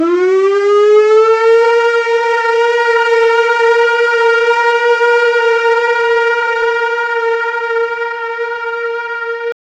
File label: alien siren